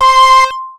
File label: Machine Punk - C5, Synths; Multisamples; Ableton Live 12 Lite; Core Library